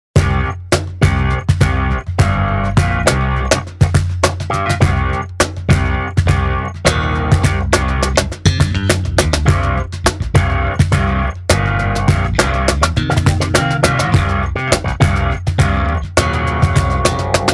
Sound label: short attack